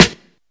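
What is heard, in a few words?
Snare; Classic Hip Hop Mega Kit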